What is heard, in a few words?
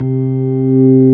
ORG B3, B-3 Organs; Sound & Vision; Gigapack I CD 2